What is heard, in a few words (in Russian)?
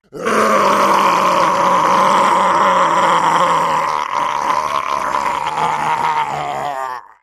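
зомби кричит рев мертвеца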